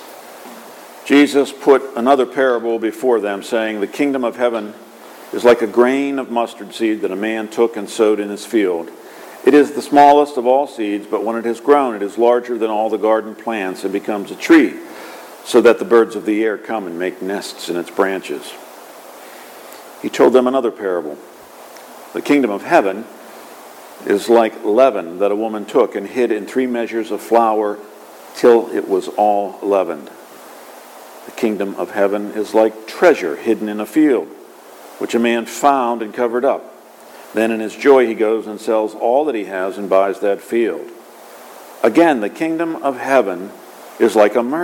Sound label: Gospel